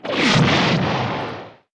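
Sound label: weapon missile launcher; PC Gamer IT CD 47; Centauri; alien-x-fire-demo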